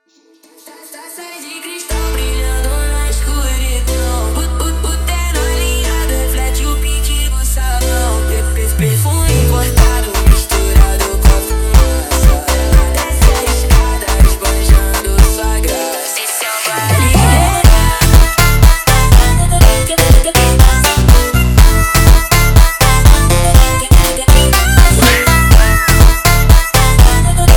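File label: slowed, phonk music; funk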